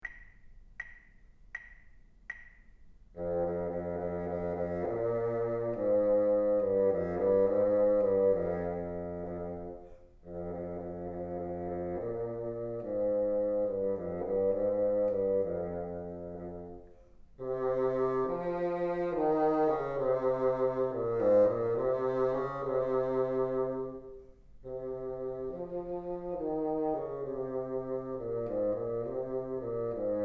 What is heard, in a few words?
teacher, bassoon